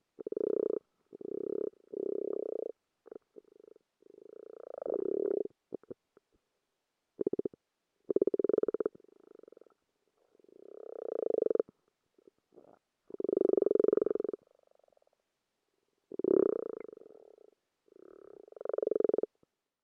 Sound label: Frog via hydrophone, Garden pond on a nice spring day.; DolphinEarPro to SD722; nature